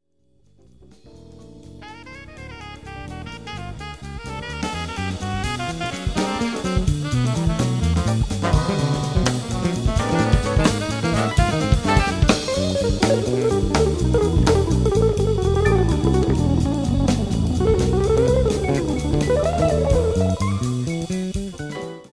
Jazz

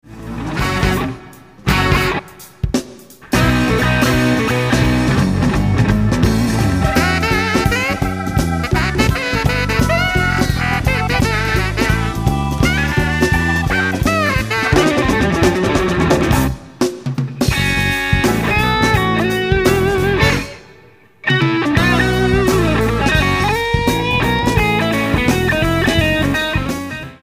Funk

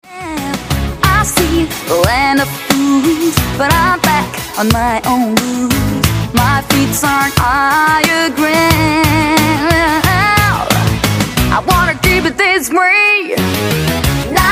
Knocking On Wood; Pop

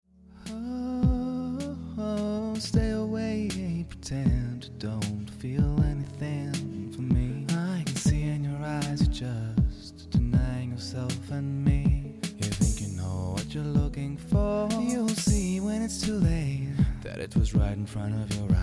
RNB

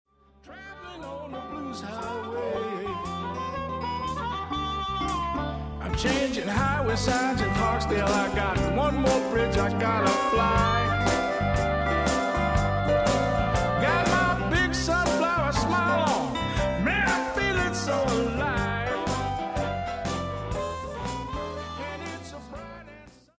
Blues

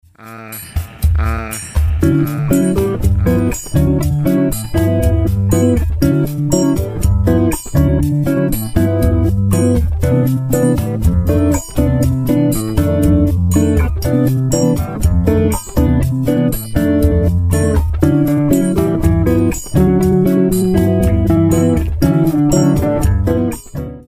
bossa nova interlude

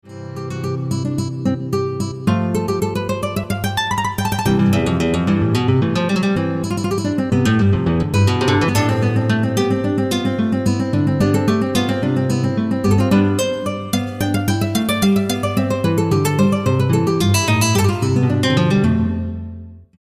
Boroque Guitar; Lindemark Music Collection

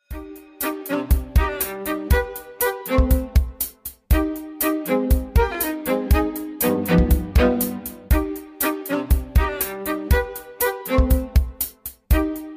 Race Orchestra

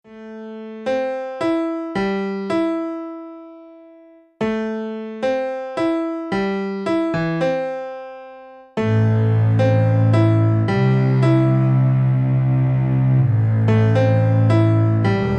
Urban Techno; Lindemark Music Collection

